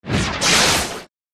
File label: Light Spirit